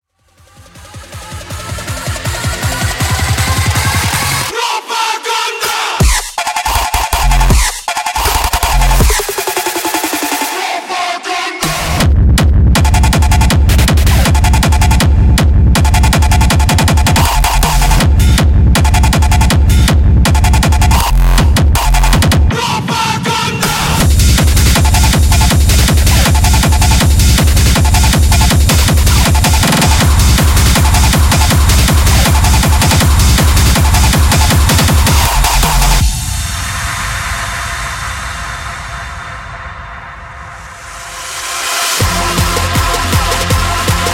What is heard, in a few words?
HARD TECHNO Bootleg